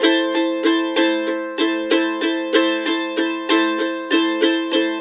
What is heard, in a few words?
RIFF, Blues Guitar Samples; ILIO; Fretworks; CEE RIFF